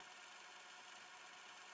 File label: Rewind Tape Loop